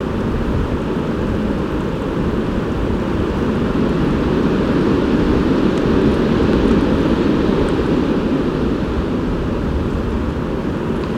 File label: Winter Property Wind